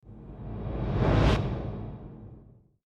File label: Swoosh